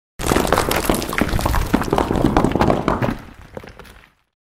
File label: STONE FALLING